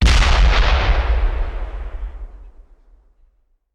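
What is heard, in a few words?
Artillery Explosion; Sound Ideas; Guns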